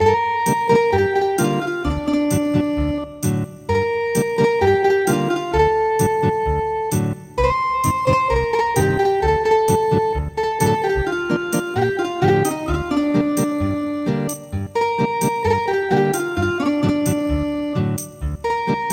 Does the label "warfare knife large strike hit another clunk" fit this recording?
No